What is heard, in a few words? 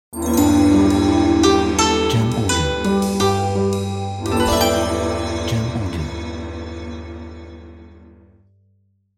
Moon Festival China Ident